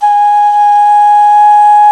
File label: Clay Flute